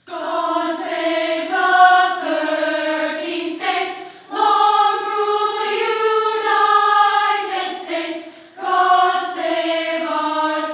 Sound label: Childrens Choir